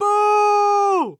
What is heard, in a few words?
Human Male Boo